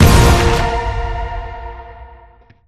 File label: Fuse Levelup; Spider-Man Unlimited; Android